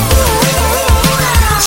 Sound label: OoOoOoAhh